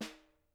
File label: SN, Percussion